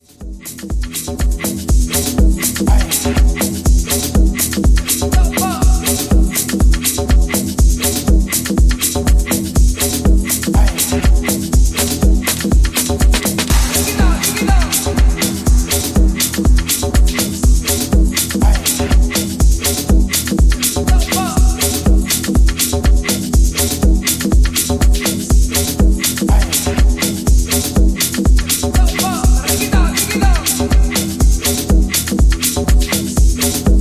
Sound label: afro deep mix